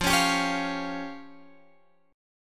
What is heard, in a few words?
F Suspended 2nd Sharp 5th F down Guitar Drop D